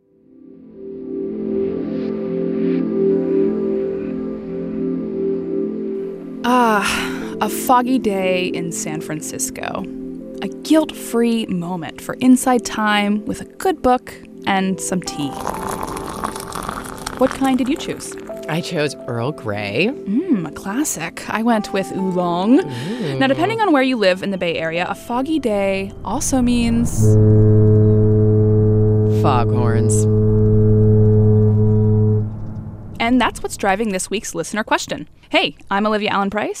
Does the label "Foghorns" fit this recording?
Yes